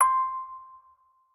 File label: musicbox